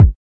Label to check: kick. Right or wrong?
Right